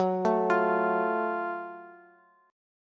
Gb Minor Major 7th Gb down Bass; arpeggiated; JGuitar

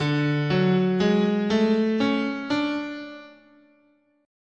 D Chinese, guitar, scale

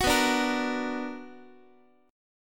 B Minor Major 7th, Guitar; strummed; JGuitar

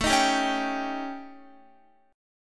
A 7th Flat 5th Guitar; A7b5; strummed

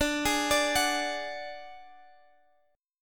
D-Major Flat 5th, D, Guitar, Drop D

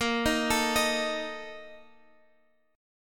A sharp Major 7th Suspended 4th, Guitar Open G

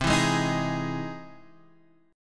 E Augmented Major 7th C

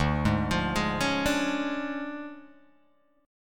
D Minor Major 7th Flat 5th D Guitar Open G

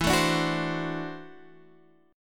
D 7th E, guitar; D7/E strummed; JGuitar

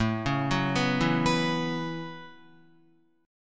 A 6th Add 9th A down Guitar; A6add9; arpeggiated

